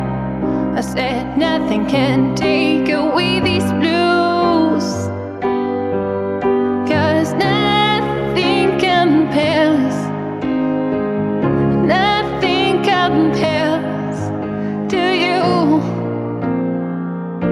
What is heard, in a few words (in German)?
Lied lang